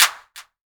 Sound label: clap, Korg Electribe ER-1